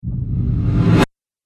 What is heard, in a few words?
Trailer Riser Abrupt End, Rise And Dive Sound Effects